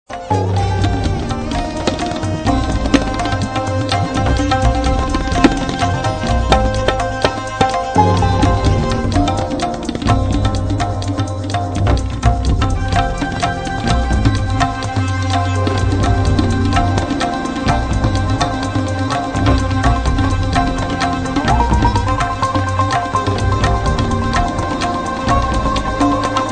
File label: funk